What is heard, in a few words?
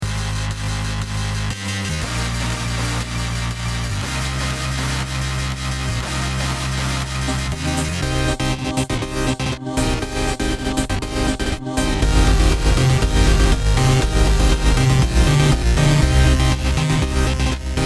Music distorted loops